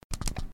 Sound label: Button